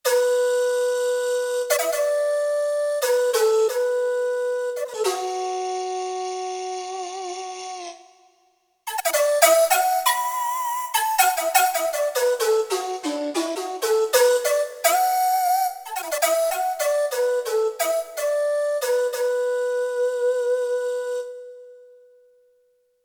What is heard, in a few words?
Shakuflute, Roland JD-800; Factory Patches